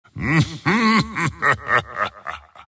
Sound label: wraith laugh; dota; skeleton king